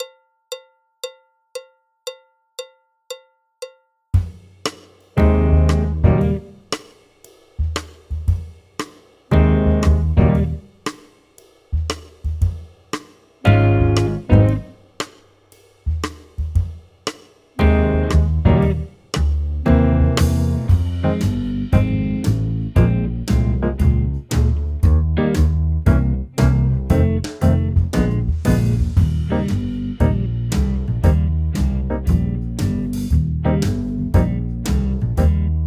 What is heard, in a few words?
trumpet; Jazz Syllabus Level 2